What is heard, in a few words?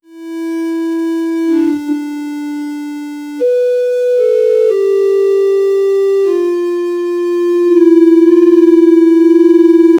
very well played, acoustic guitar